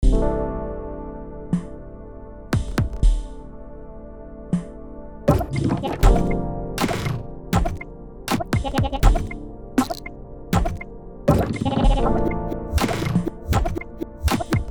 synth

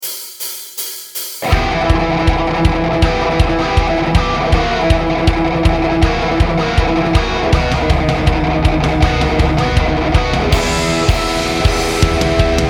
Hungarian Minor Etude

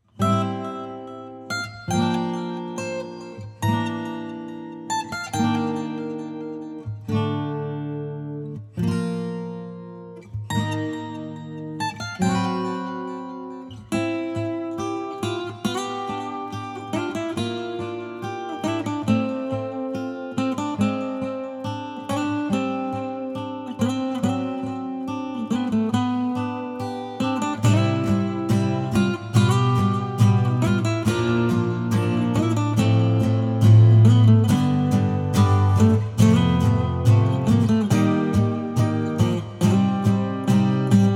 Acoustic

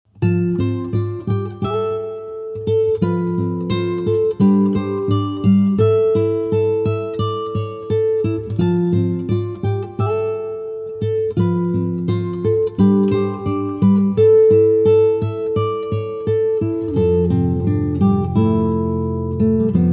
Acoustic

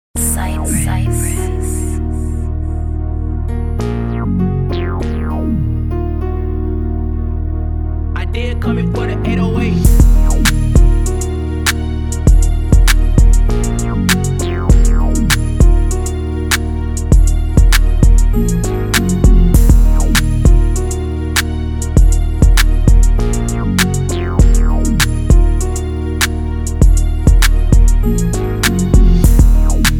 Instrumental

